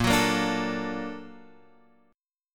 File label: A sharp Augmented Major 7th